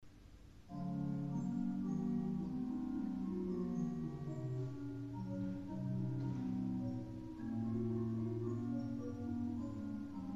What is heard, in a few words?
Mertel